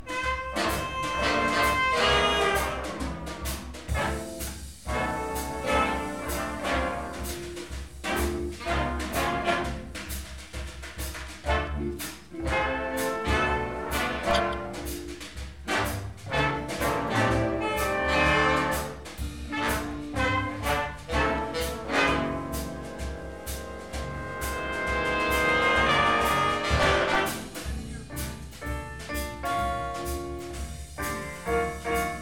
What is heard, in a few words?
Brush Taps, a tasty drum feature; written by the great American big band leader and drummer Louie Belson